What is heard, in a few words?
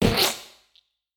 Vocal Splat